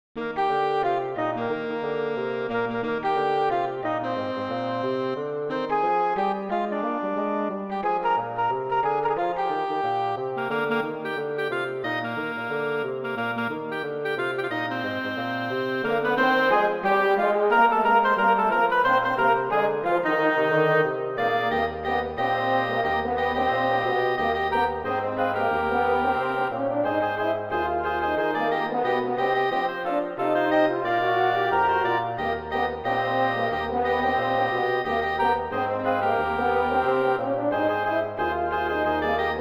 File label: Jazz Xmas Song Jingle Bells; Woodwind Quintet; Pierpont, J.